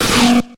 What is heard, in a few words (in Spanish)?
Grito de Pupitar